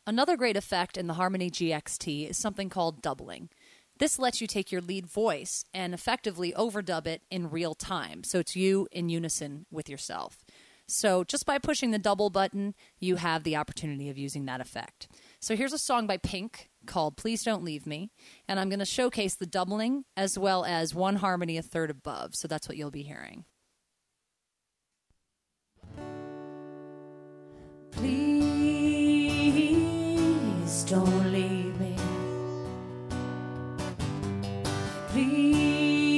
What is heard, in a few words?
Doubling; Harmony-g-xt